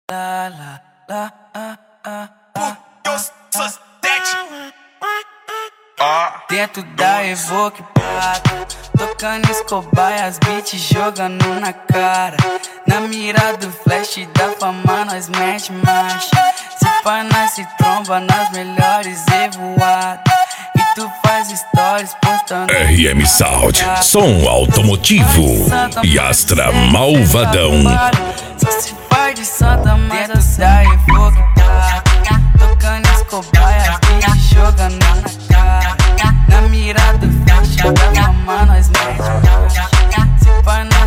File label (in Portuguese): som automotivo